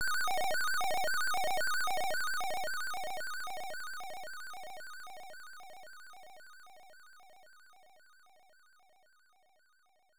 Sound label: Monster Moving; nes; Dig Dug